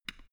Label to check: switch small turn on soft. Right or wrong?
Right